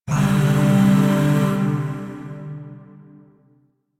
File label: Zariman Void Shawzin Pent Maj Chord